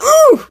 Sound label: hit; 罗莎